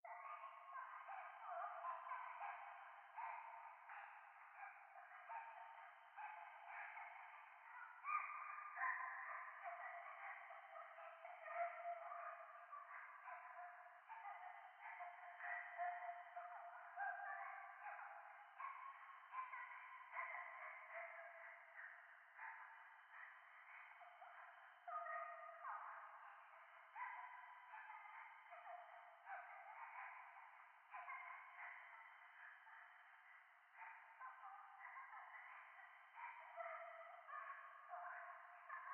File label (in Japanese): 廃墟 可愛い喘ぎ 山奥